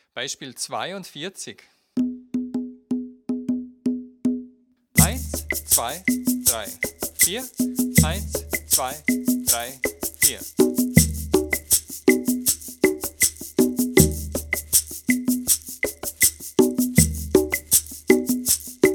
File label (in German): viererrhythmus